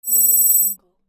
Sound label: digital text typing